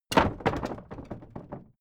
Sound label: old wood case drop